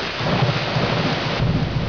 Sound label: rain